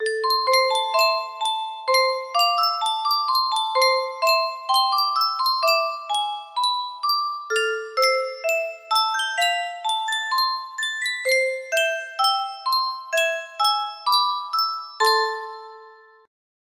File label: Yunsheng music box, music box melody